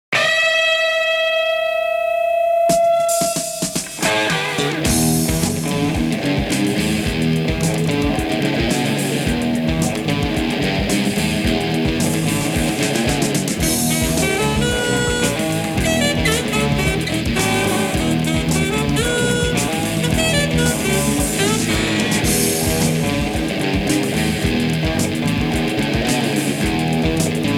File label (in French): Rock